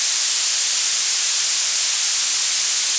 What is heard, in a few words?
whitenoise diff